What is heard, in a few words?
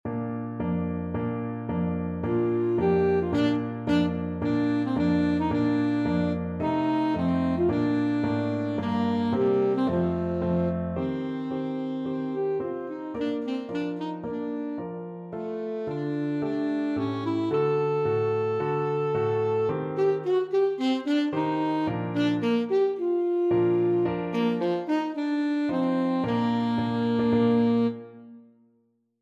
saxophone